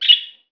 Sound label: bird